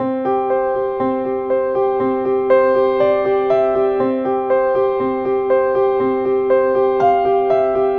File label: Simple Piano Loop